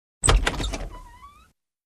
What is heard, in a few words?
Wooden Door open close